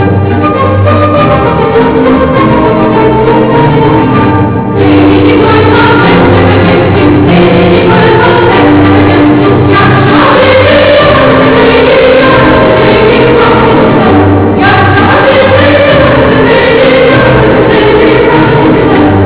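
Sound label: girls' choir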